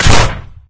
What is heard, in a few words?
Vehicles Hoverboard